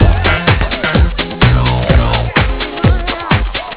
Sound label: music